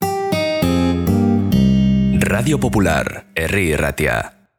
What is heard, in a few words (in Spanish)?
BAJO Y GUITARRA, RADIO; EMITE RADIO POPULAR HERRI IRRATIA